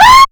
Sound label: scream synth lady; ooh ahh